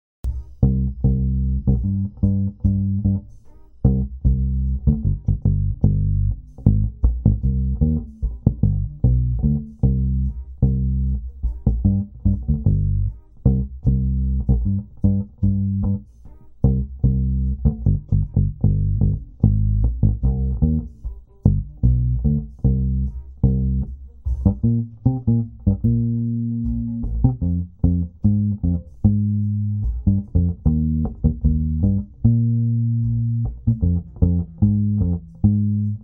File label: bass guitar